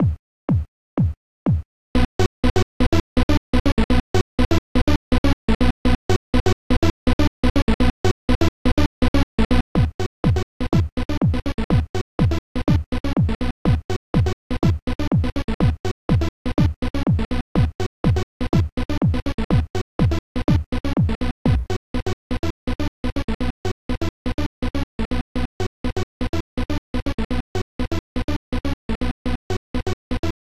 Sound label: Techno; The Net Walk